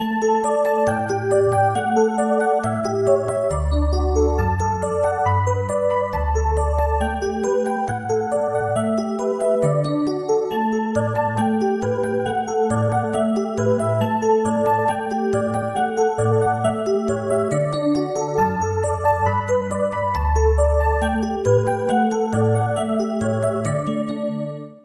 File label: twinkle twinkle little star ringtone